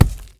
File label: wall bank; Golden Crayon Sounds